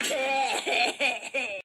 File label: crying; oddbods; slick